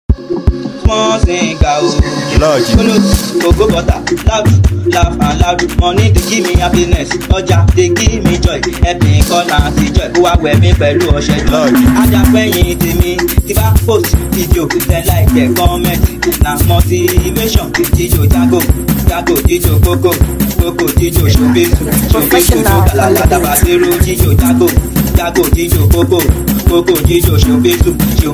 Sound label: Professional Beat